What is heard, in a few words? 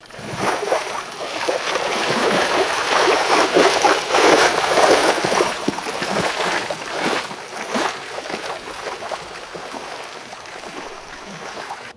horse splashing through rocky stream; footsteps